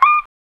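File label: GTR PIK, guitar pick; E-Lab; X Static Goldmine 1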